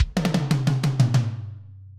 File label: tom fill; Tom Tom Drum: Fill